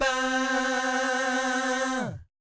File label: VIB FX, Jazz FX